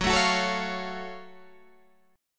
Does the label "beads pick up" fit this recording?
No